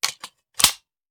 Machine Gun Foley; Sound Ideas; Guns

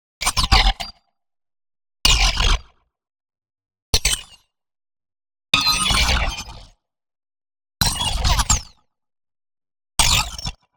ZAP Phase Leak; boom library; Neon

